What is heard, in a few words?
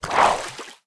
Fish Critical; The Denpa Men; Android